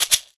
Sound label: Household Shaker, household rhythm samples Hits